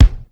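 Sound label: bass drum room; 300 Drum Machines; Yamaha Motif ES-Series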